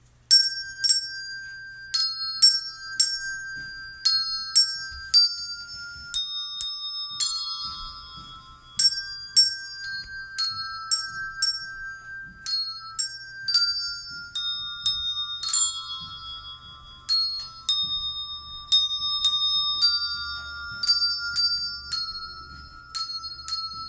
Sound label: O Come All ye Children Bells